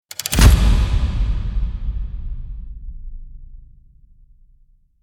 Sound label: Stadium spotlight hit arena entrance